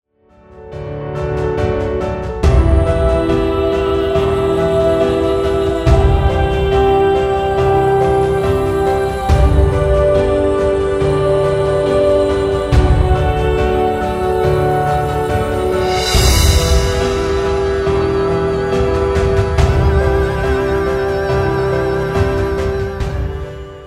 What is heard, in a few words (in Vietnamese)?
Hop xuong piano bi kich phim su thi va nhac phim truyen hinh